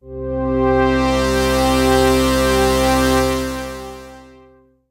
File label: space strings; lmms